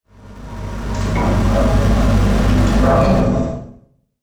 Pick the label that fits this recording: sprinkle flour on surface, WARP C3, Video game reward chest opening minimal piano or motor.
motor